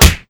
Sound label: slap hit; gesource; weapons; slappers